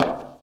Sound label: bleacher step; Golden Crayon Sounds